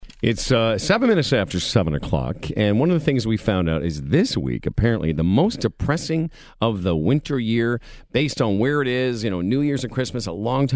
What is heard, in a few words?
Laughter Yoga; EZrock